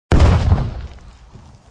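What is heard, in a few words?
enemy explosion; Chase-Racing-Cars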